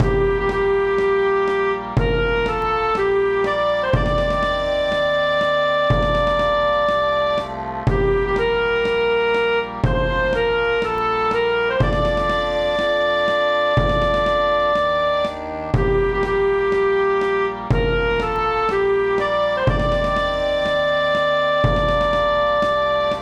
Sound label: marching